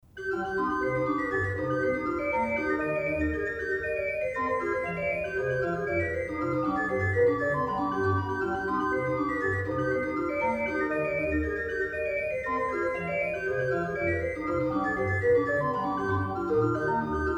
partita in c minor; johann sebastian bach; o gott du frommer gott; bwv 767; partita 4

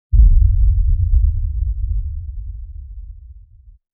uber low hit complex short

